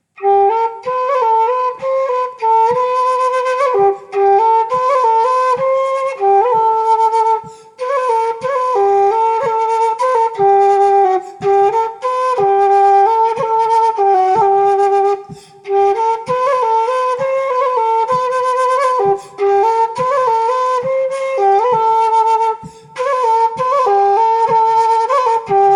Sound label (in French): Air limousin